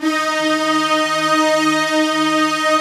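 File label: Chinese orchestra, Filter; Optical Media International; Sonic Images Library; Chino Filter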